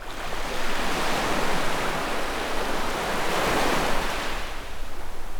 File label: water waves loop; 1944 Battle Of The Bulge